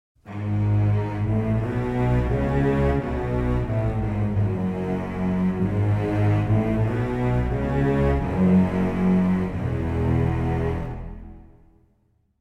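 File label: Pre Orch Ens 6 Celli 4 Double Basses 8va Legato; Audio Imperia Areia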